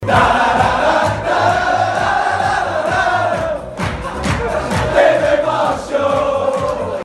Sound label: chant